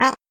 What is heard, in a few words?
peeper